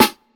sparkle snare one shot a key, steel snare drum tuned to the A note